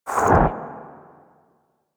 Operator Wraith Void Exit; Warframe; Focus; Powers; Wraith Movement

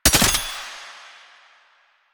Gunbrella Mech, Weapons; Warframe; Tenno; Koumei

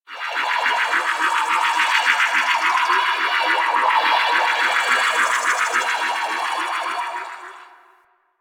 Hover A; Warframe; Quests; The New War; Lotus Gem Part One

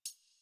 Computer Room Beep Misc; Warframe; Ambience; Nineteen Ninety Nine

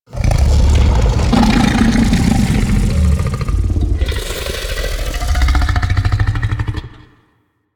Pit Monster Exhale, Infested; Sleep; Warframe

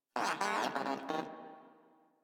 Moa Aggressive Vocal Agree; Warframe

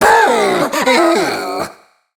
Treasure Box Pain; Warframe

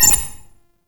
Flying Robot Beep; Quests; Veilbreaker; Ambience; Kahl Quest

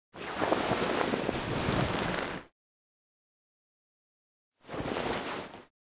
Arm Down Foley; Operator; Warframe; The New War; Throne Room

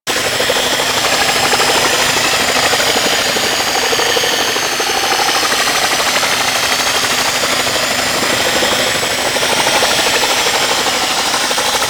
Archwing Stealth Movement Engine Loop; Warframe; Vehicles

